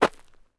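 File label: gravel, steps; Terrarum; mining sounds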